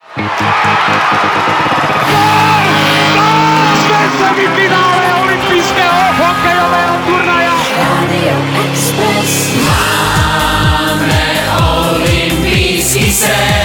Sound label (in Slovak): medailovy song jingel